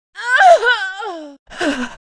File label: Mob die; Monster; 묘족; 가가공주; 2단계